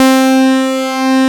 TONE C4; Q Up Arts; Dream Experience; DANCE

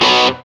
GTR Crunch, Dirty; Club-50 - Foundations Roland

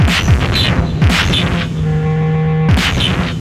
WAR FX

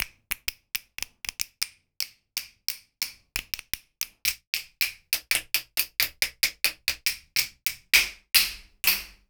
Hand Sounds Snaps

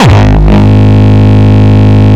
Longkick, kicks

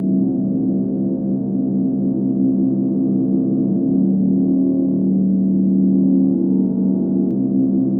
THE DEEP R, ATMOS; AMG - Vince Clarke Lucky Bastard

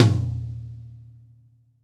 Gretsch Tom 14-inch; 1967 Gretsch Kit; One-Shots; Sonic Foundry (Sony Creative Software); Vital Drums

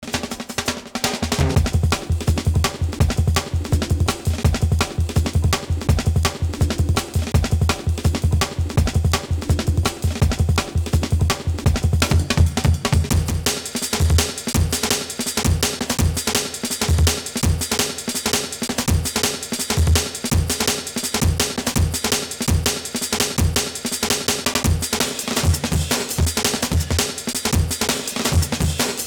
breaks, Jungle; Zero-G Jungle Frenzy 1

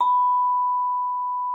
VIBES; Xsample VOL-4

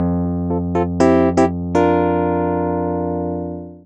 PUNCH REEDS; East West; Twisted Textures-BT

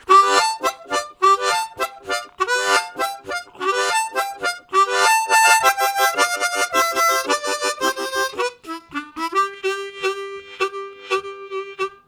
Octave Vamper, Harmonica; Zero G

